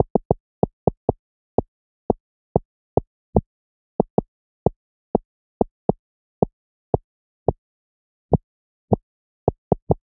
ruins rain outdoor loop vibration

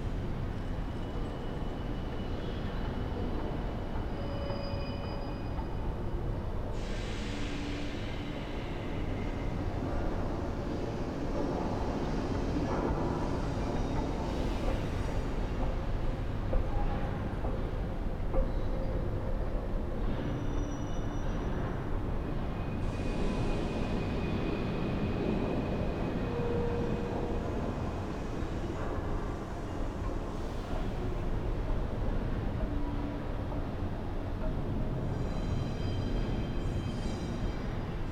atmos citadel outside; Hollow Knight: Silksong

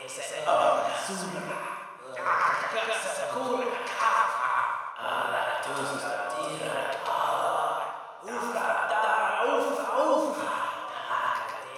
chant under bell; Hollow Knight: Silksong

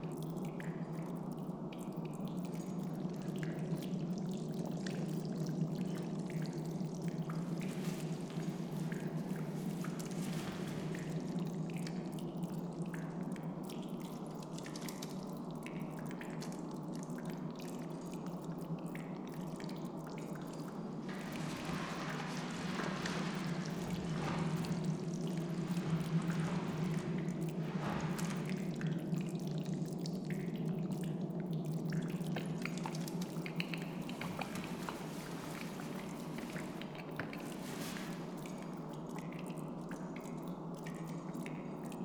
atmos dust

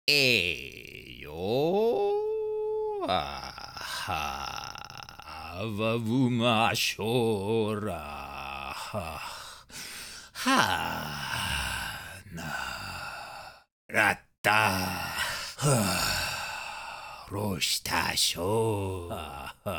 Male Moan Long; Hollow Knight: Silksong

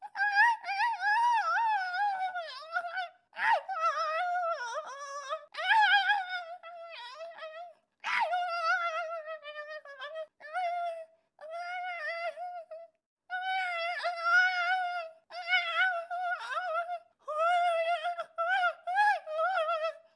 scared loop distressed; Flea

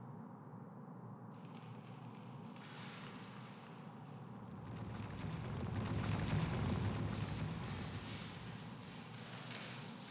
atmos wilds; Hollow Knight: Silksong

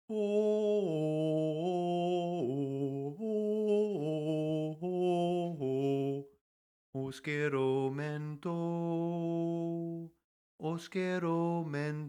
sing; Hollow Knight: Silksong

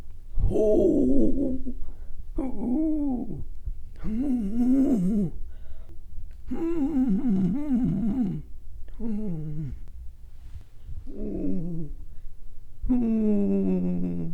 scared loop

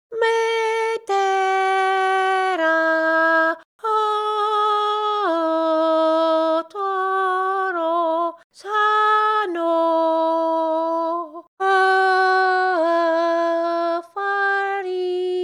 Seamstress sing

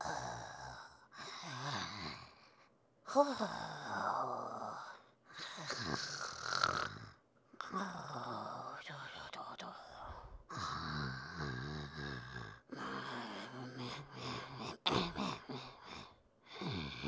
roof sleep loop; Runt

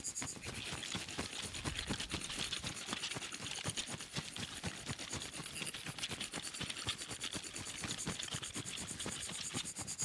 beastfly fly loop

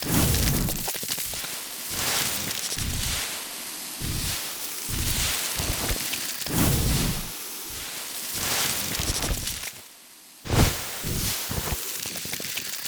sand centipedes loop ambient

